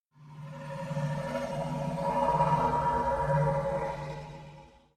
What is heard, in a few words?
mov plat; star-wars-rebels-team-tactis